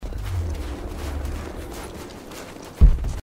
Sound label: walking snow; rise of the tomb raider; lara